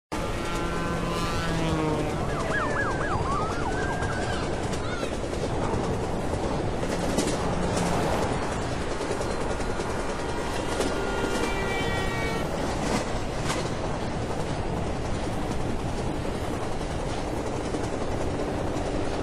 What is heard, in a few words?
ambient; Marc Ecko's Getting Up: Contents Under Pressure; XBOX